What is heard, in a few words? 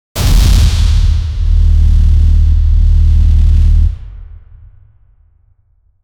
epic bass hit like a